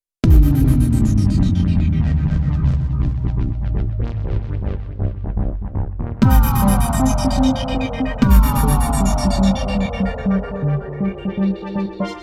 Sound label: 1 Note Break Do, Korg Radias-R